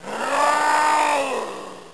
scream

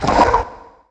facehugger spot; svencoop; aliens

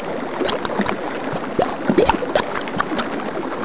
lava flow

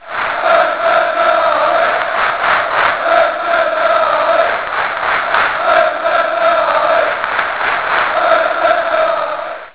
chant, soccer; firearms

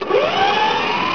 spin up; hassault

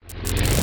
tesla spin up, weapons; hunger

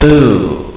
boooo

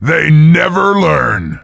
cheer; svencoop; voice commands; SeriousSam

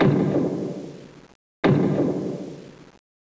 tick

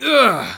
die; svencoop

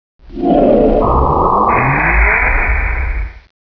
manta idle

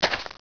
footstep dirt; footsteps; gearbox

